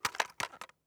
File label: pickup quiet